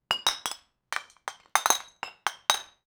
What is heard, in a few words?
soft glass clinking, bottle